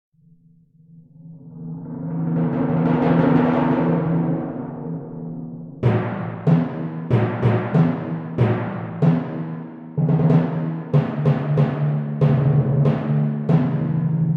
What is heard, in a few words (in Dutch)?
Drumming pauken